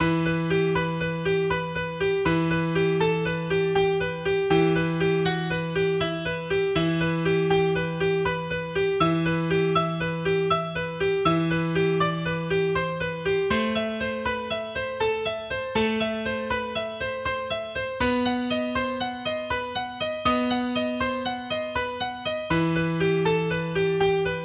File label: Spanish Romance Traditional Solo Org plus Acc; EGit